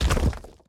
dirt break; teardown; ps5 windows xbox series xs

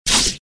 Sfx Knight Attack Hit; Mighty Knight 2

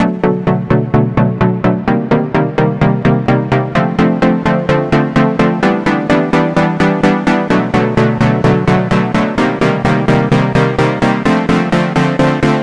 Synth Main

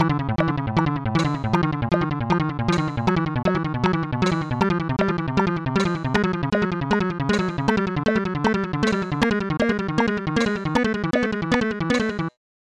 Pause Timer

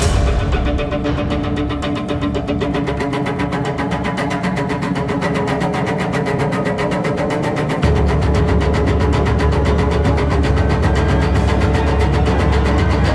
Combat

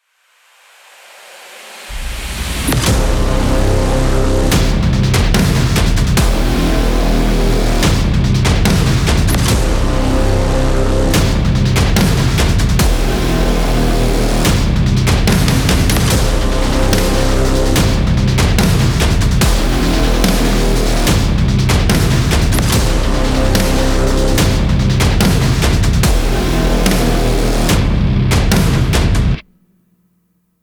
Main Heavy; DLC 2 Spear Main Heavy 5

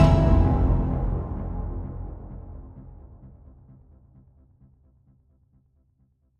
String; assassin s creed unity

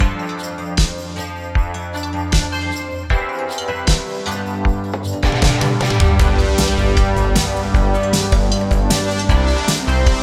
80s Victory; Bogheim

